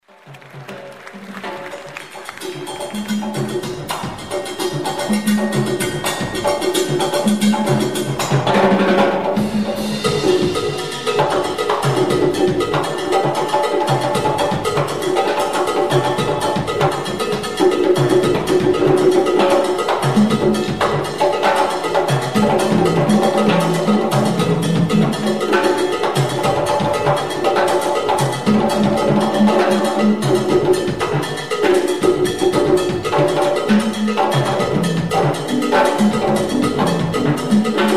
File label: solo, conga